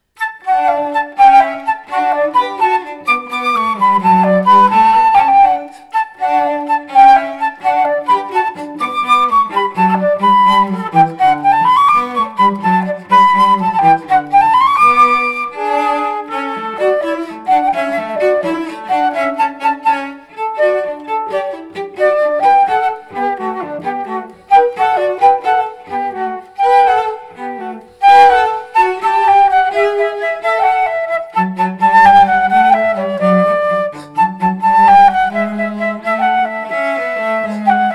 cello flute; Haydn presto

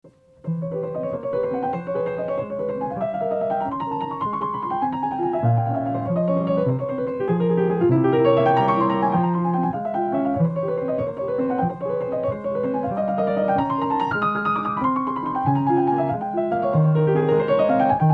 piano Etude; Chopin

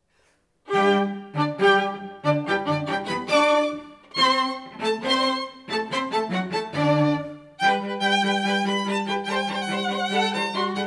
string trio; Eine Kleine

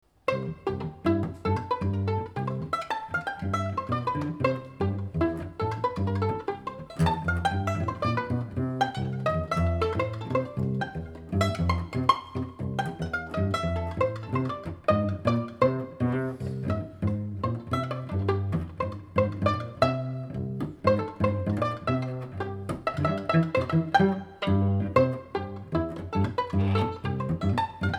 string duo

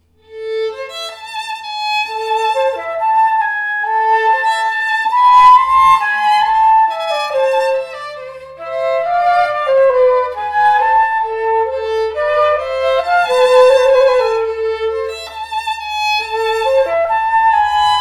adagio flute; Loeillet

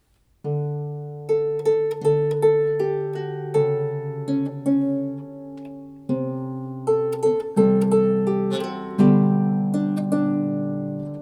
harp; solo

